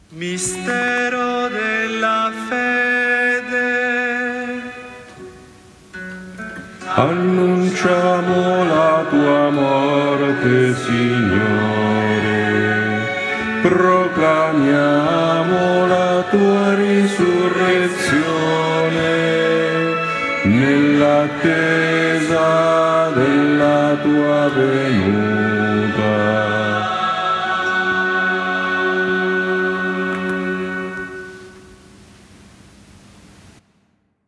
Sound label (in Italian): Anamnesi contralti bassi; Siyahamba